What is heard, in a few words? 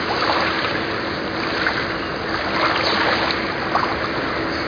water lp; Spec Ops 2